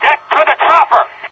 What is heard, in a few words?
chopper, soldier; Left 4 Dead 2; Source Engine; video games; npc